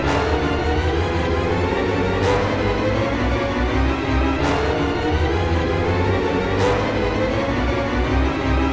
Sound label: Music Transition; MajorProblem